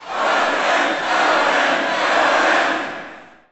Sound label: Boxing Crowd Chant; Crowds